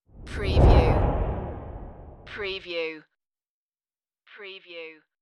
Rush

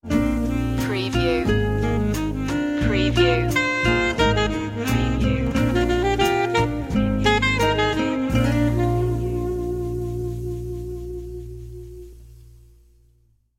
viva fiesta sting

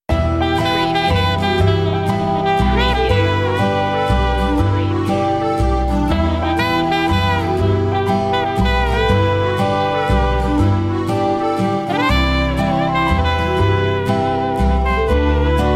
waltz

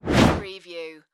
Whoosh; Sound Effects Bible

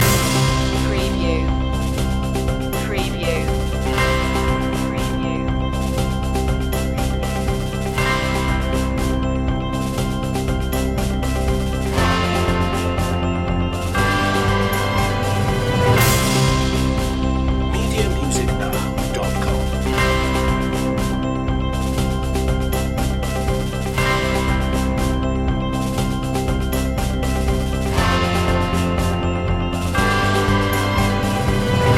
loop

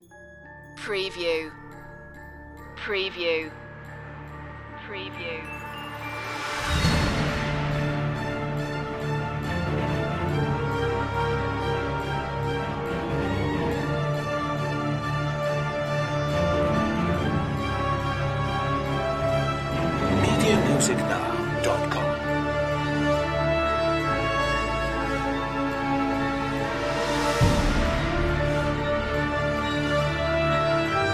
no choir

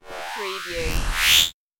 alien power charge

